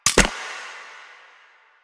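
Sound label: Mechanism, Sidearm; Weapons; Warframe; Tenno; Wraith Sidearm; Epitaph Prime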